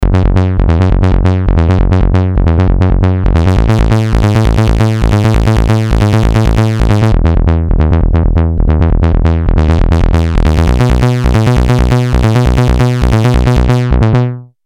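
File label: bass bassline, Moog Minitaur Bass Synthesizer; analog monophonic synthesizer; 2 VCO oscillator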